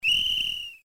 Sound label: whistle